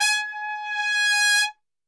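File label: G#2 trumpet, Brass Super Section; Best Service; TRP SWL; 90 s Sample CDs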